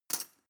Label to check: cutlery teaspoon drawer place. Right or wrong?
Right